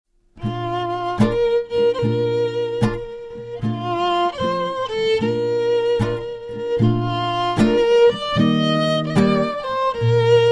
Wedding March from Lohengrin; Wagner

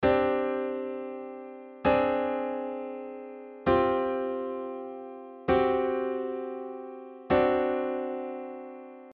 suspended chords

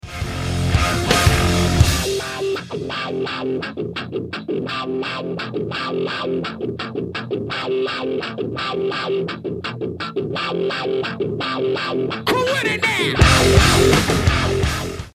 Wah-wah guitar

